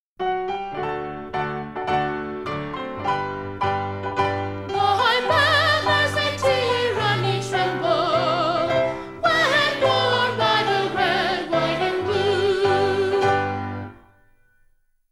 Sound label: Columbia Gem Of The Ocean W Vocals